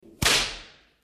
whip

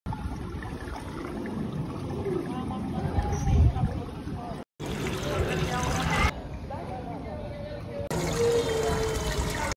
Pool environment; محیط استخر

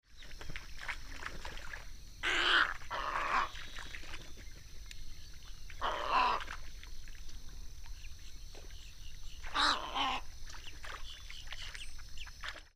Snowy egret

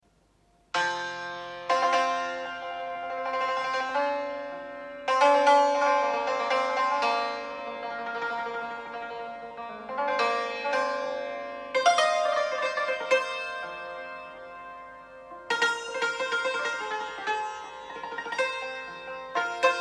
santoor

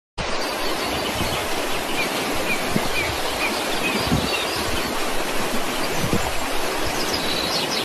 Valley

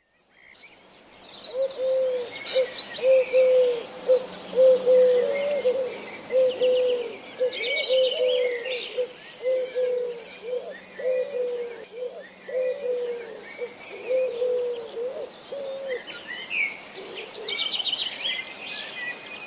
sound eurasian collared dove

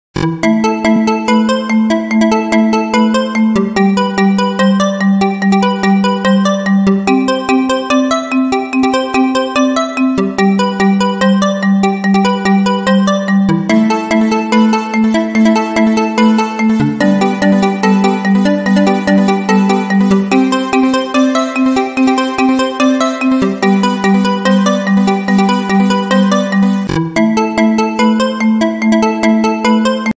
iphone 16 ringtone

